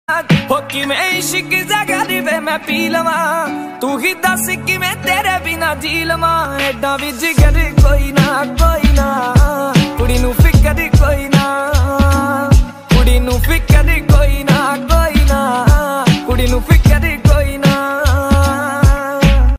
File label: punjabi ringtone